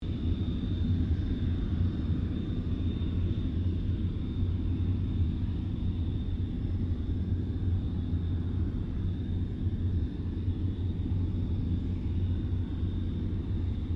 AIRCRAFT CA-27 SABRE IDLE DISTANT; CA-27/F-86 Sabre; Airplanes; Vehicles

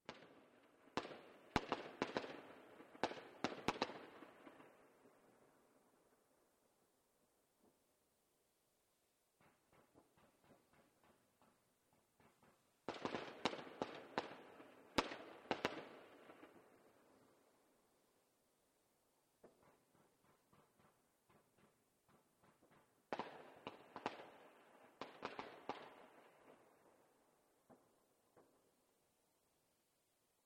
Gunfire Distant Battle Background; Battlefield; War; Battlefields

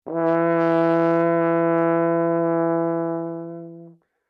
HORN E4 FF LONG, French Horn in F; Alexander French Horn in F; Musical

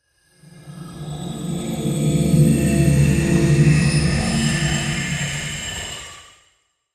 Whoosh Ethereal; Debris, Swirl, Production, Element, Imaging, Accent, Transition; SoundEffects-BlastWave

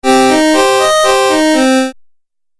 Game Music Phrase, Video Game Sound Effects

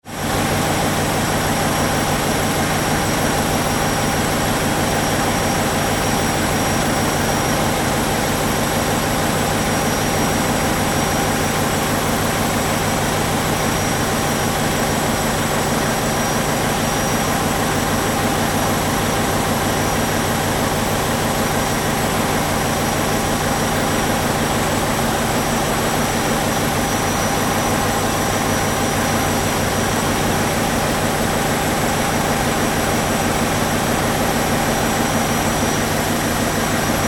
Ship Large Car Ferry Machine Control Room; Tom Hutchings

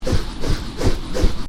Swish twirl, Swish: Twirl Loop; Whoosh Sound Effects; Whooshes (Sound Effects:Sound Design); Stuart Duffield

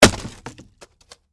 Impact Wood Hit, Sledgehammer, Debris; Hammers; Tools; BlastWave

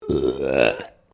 Burp female medium, Human Burping

